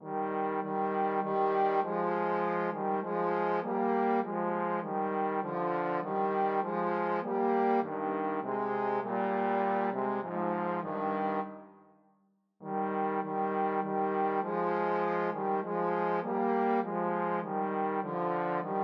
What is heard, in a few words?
drink to me trombone duet